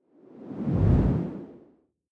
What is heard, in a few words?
whoosh simple long low; swooshes-swishes